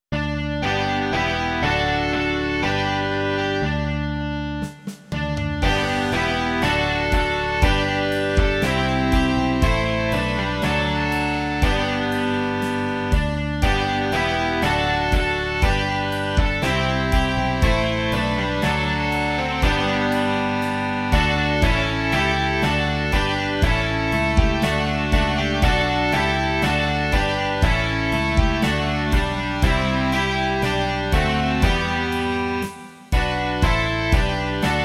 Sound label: rock